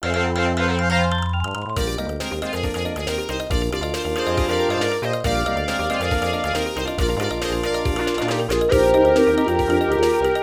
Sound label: Battle Fanfare (First Strike); Paper Mario; Mario Story; Nintendo 64